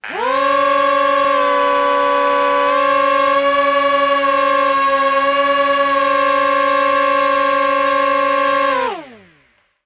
toys drone or rc plane motors whir med long

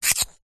foley metal slider small slide; zapsplat

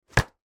cardboard sheet folded in half; foley

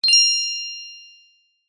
fantasy magic chime ping wand fairy godmother

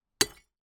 impact glass jar hit and break with hammer

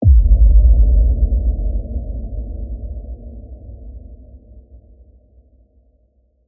Impact; PMSFX

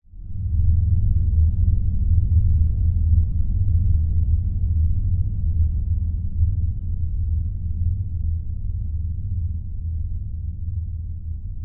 science fiction dark fading drone lfe; zapsplat

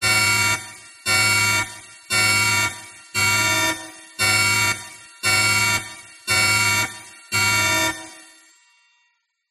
science fiction alarm nuclear radiation emergency; zapsplat

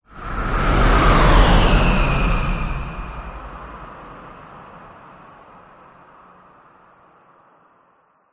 whoosh; orlando lanzini

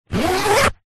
laptop case fabric pouch zipper; foley

